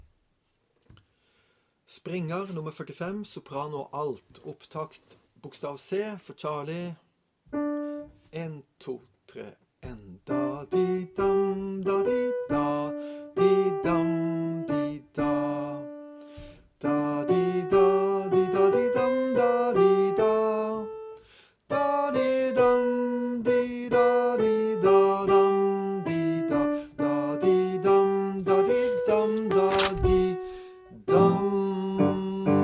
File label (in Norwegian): Springaer Sopranalt; lydfiler for korister